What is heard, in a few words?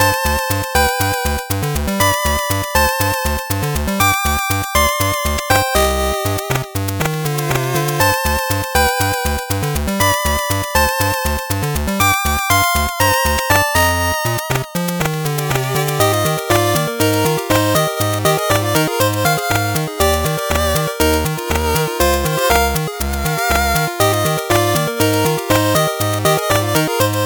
8bit bgm slow